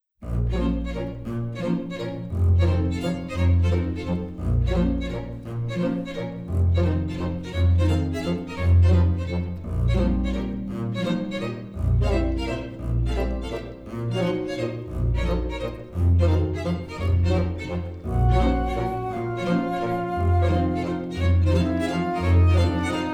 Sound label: Strange Waltz